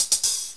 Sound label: dr loop; Descent